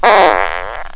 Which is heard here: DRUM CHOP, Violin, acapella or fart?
fart